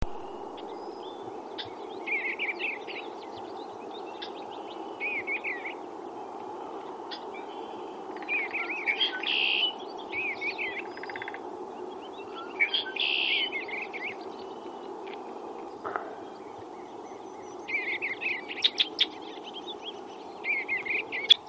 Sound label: bluebird